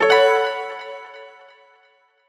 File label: piano success notification; audioblocks